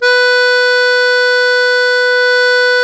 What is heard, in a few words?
MUSETTE B2, Historical Instruments; USB Soundscan vol.23; AKAI